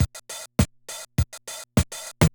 Loop, classic machines, rpm 40

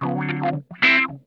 RUDE WAH, Funk Guitar; 90 s Sample CDs; Zero G

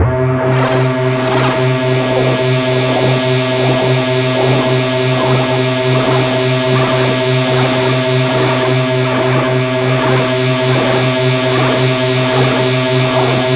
C3, Synth Line; 90 s Sample CDs; NiceBeats; SynthLine VOL-16

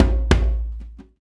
PRC HAND, Africa, Perc Loops; Roland LCDP14 Africa VOL-2

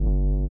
LIQUITAR; Spectrasonics; Metamorphosis

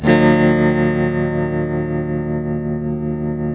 TELTMDMIN E2, TELE CHORDS, Guitars; Spectrasonics; Hans Zimmer Guitars VOL-1

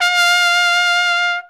SOLI TRP, Alpine Volksmusik TROMPETEN

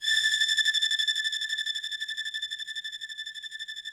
ROUGH STRNGS, Yamaha VP-1; Music Store

